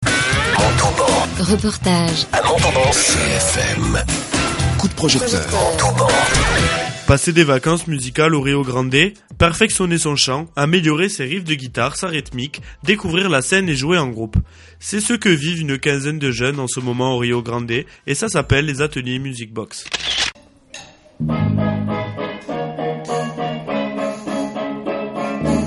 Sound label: Music Box